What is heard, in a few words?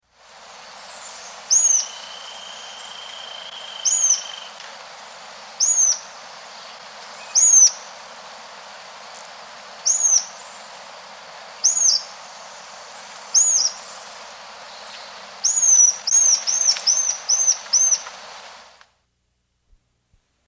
Zeledon's Tyrannulet; S. America